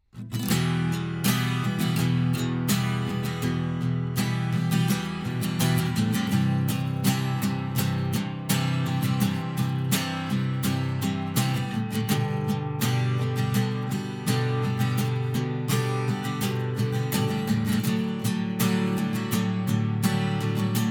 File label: Acoustic Cover